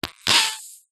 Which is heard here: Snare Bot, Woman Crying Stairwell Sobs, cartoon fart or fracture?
cartoon fart